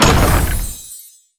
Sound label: boost Land; netent